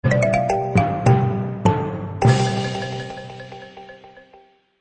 Complete

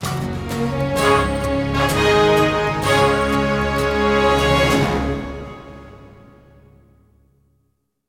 Combat Win; Combat Win Reg2 02

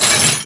Enemy Pirate Anchor Swingup; gamerip; disney star smash; android

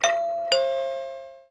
Chime Normal

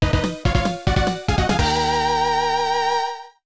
Boss Clear

